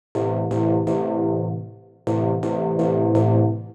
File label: DEEPHOUSE ORGAN 125 A